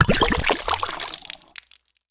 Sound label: Potion Death